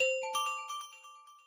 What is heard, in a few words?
Child